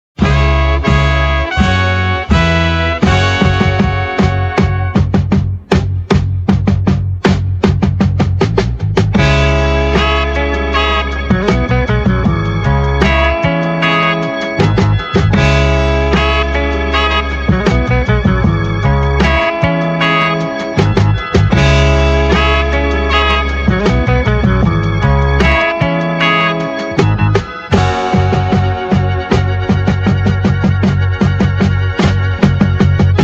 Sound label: Cm 155; Masada Beat Block purge vol 3